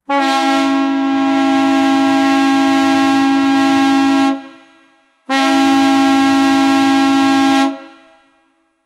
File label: a very dramatic and heavy, horn